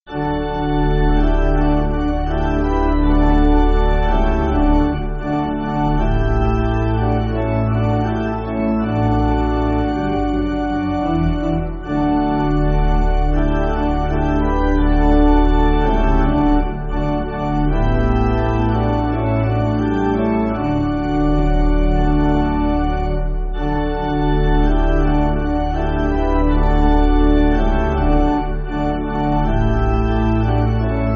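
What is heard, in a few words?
Pipe, Pipe Organ, Music Backing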